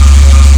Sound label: cont laser; garrysmod